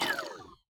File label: Wires Power Off